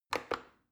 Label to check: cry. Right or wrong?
Wrong